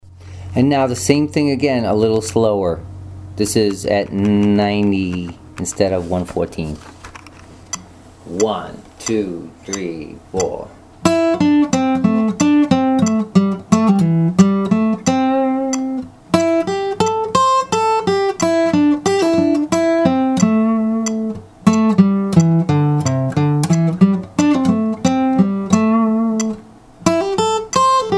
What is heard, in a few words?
hammer ons and pull offs